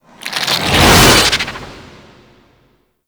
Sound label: Infested Collar Proj A; Warframe; Infested Alad V